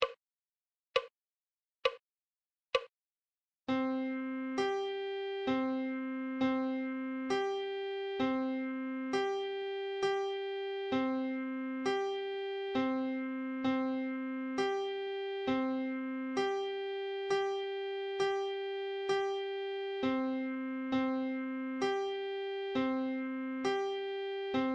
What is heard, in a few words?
musical reading in treble clef Adagio